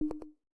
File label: button click; puzzle blocks